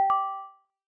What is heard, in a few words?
notification